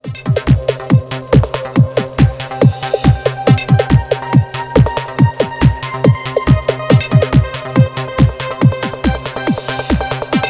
trance ringtone

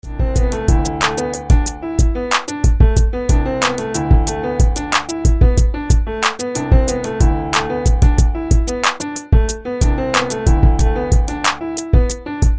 dark hip hop ringtone

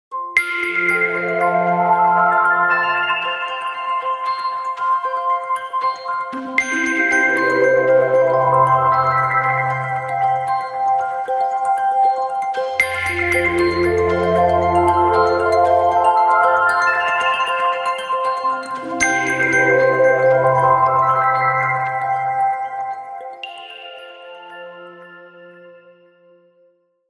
forest morning ringtone; android

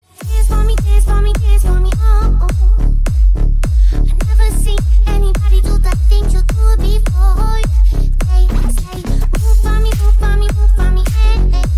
dance ringtone, Xylophone ring tone

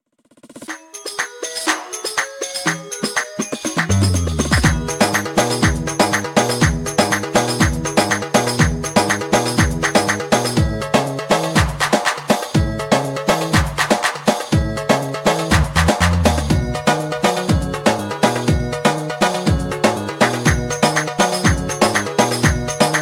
arabic inspiration ringtone

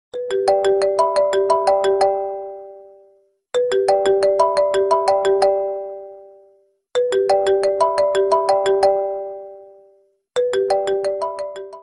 samsung galaxy ringtone

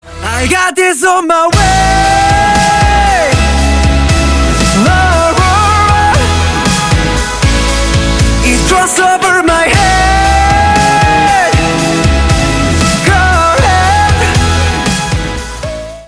aurora chorus ringtone

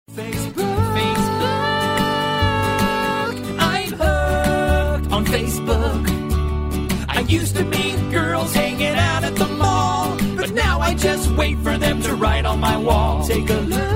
facebook tone ringtone

